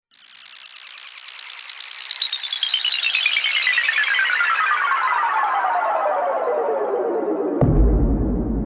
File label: 3d Crystal Sound